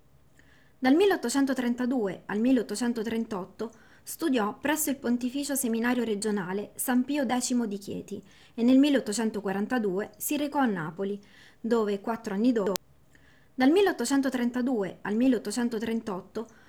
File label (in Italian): Tenore; Napoli